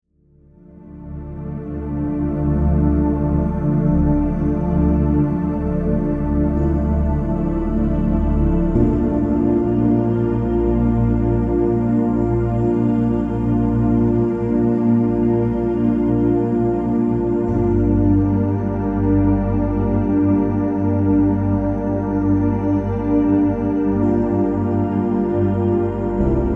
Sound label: Release Theta